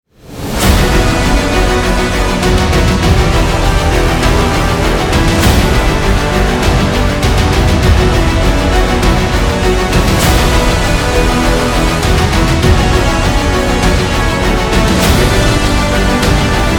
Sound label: tension opening